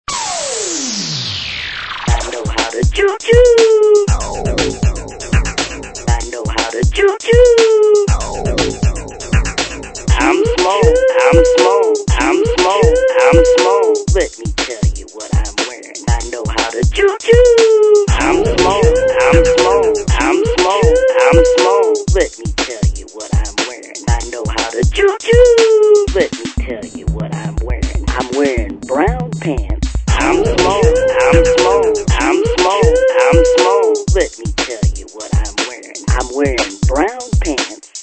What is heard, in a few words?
Techno